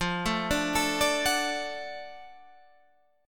F sharp Minor Major 7th Sharp 5th

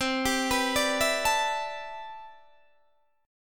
C Major 13th C, Guitar DADGAD

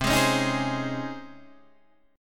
C sharp Major 7th Suspended 2nd, C

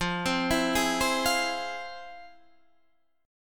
F Minor Major 7th, FmM7 chord; FmM7 arpeggiated; JGuitar

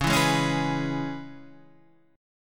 C sharp Minor 7th C sharp, chord

